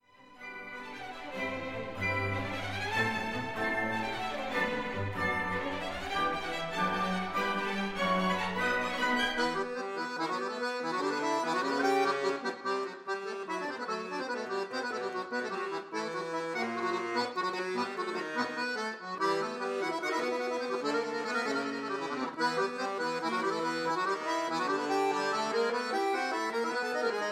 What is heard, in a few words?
accordion